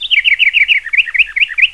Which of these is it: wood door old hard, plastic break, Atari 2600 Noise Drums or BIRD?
BIRD